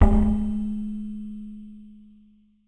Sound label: Tone, IDM